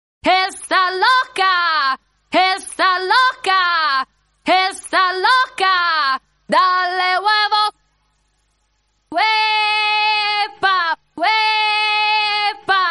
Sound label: Acapella Version